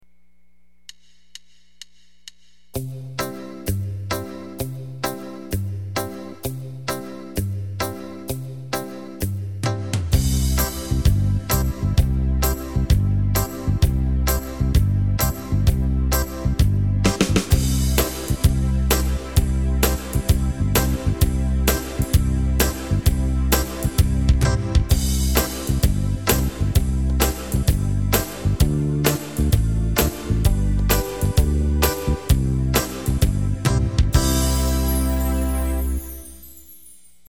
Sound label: swing; yamaha